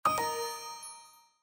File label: Windows Notify; Windows 7 Sounds; Garden